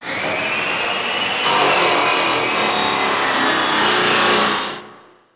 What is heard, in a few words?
CIRC SAW, construction